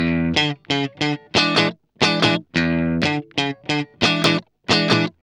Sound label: GUI 90 LICKS